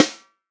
REAL DRUMS, POP KIT; Best Service ProSamples vol.43 - Real Drum Kits

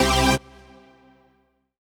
HOUSE, House Dance

